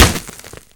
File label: concrete, impact bullets; hl2